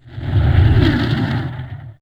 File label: fly by